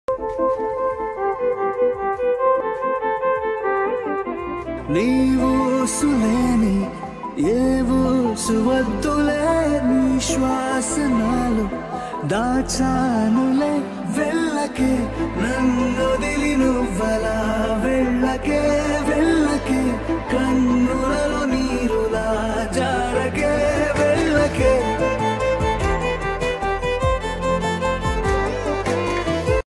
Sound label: tamil ringtone